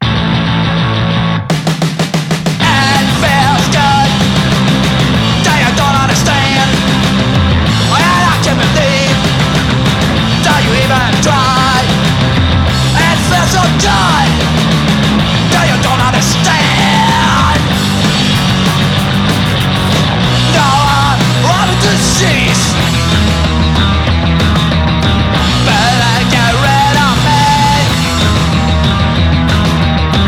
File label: Electric Guitar